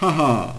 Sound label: Haha; Skat 3.0